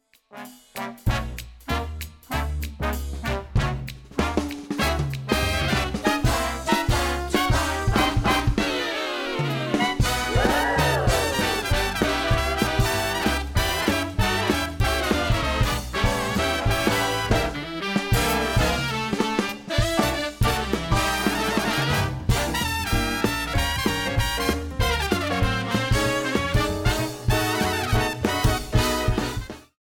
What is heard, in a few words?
bomb planted